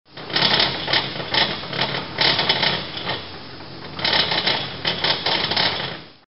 zvuki pozharnoj mashiny

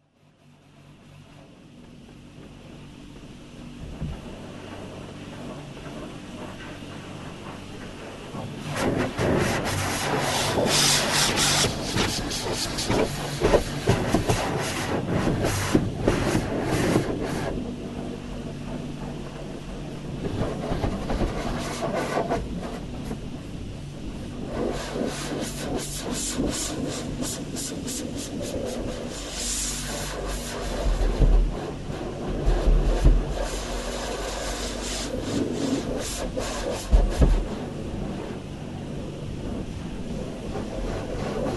zvuki avtomojki

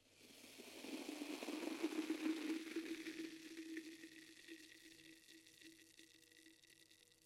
zvuk gazirovki

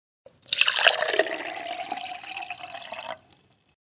zvuk nalivanija vody v stakan

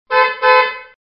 zvuk signala mashiny

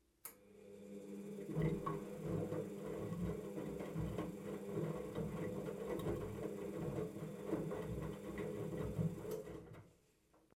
zvuk stiralnoj mashiny

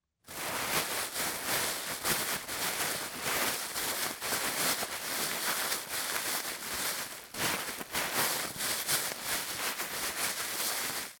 zvuk paketa

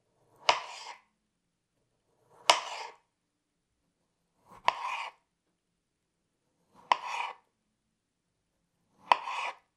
zvuk nozha

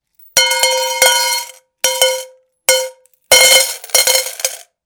zvuk melochi

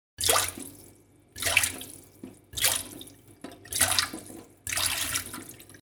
plesk vody zvuk

